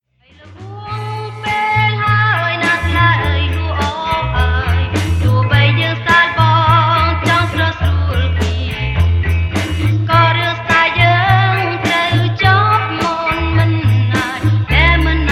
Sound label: Khmer Rap